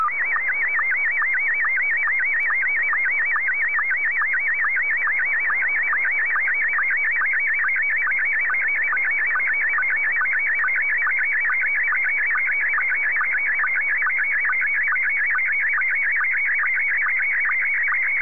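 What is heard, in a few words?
Scottie 1, slow-scan television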